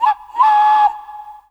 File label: WildFX, African; Quazibeat; African Secret; SFX